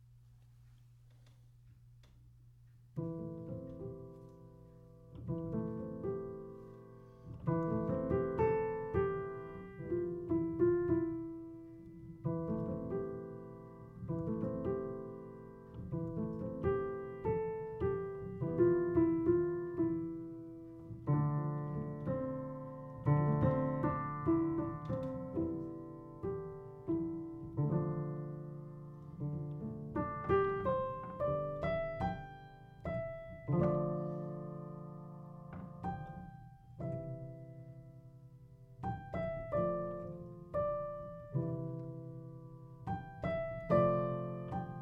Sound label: Improv in A-minor; Wednesday